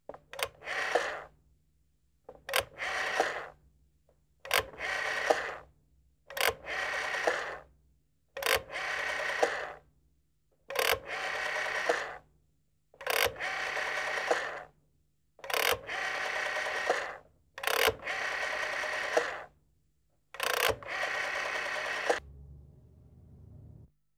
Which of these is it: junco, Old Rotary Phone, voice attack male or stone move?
Old Rotary Phone